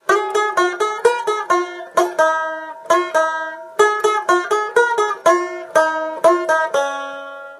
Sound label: Banjo; out from boneville; Camptown